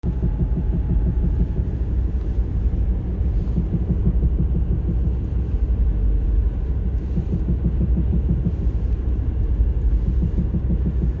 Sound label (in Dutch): Carisma herrie, Wiel Maak herrie; Mitsubishi CARISMA 1998; Tijdens het rijden maakt mijn Carisma een sorrt van bonk geluiden